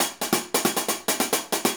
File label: Hi Hat Pattern